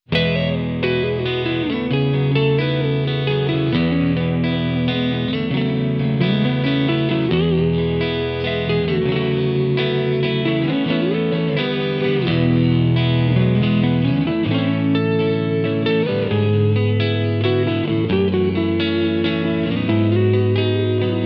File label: MAXON OD9PRO+ Bypass; No.1 Clean Tube; OD9Pro+：Normal mode; Sugi Guitars DS496; Amp：Clean; PU：Single